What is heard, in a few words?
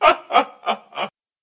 monster gorgon